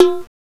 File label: TIMBALI, Fairlight CMI, DRUMS